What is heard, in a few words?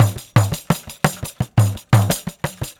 Pandeiro, 4-4 Drum Loops, Perc Loops; Drum Tools